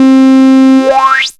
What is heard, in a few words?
syn c, Dance Synths, Wasps; Best Service ProSamples vol.37 - Dance Synths